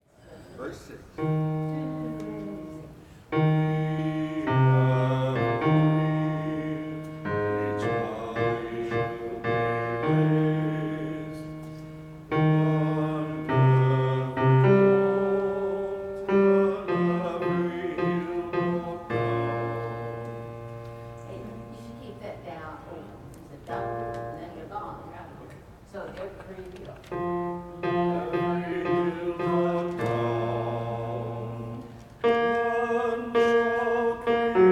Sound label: We Have a Dream - bass